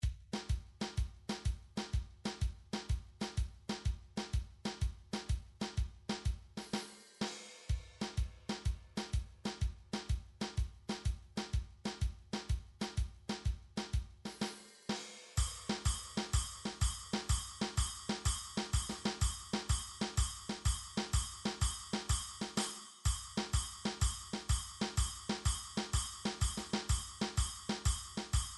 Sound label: drum midi polka